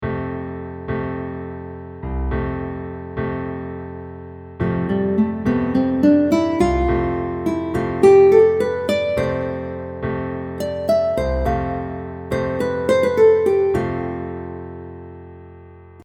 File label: F lydian